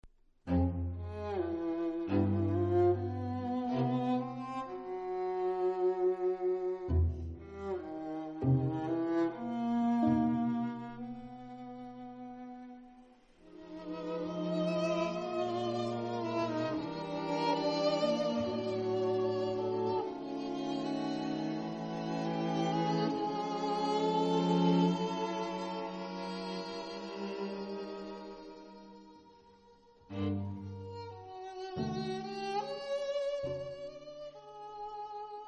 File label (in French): Quatuor la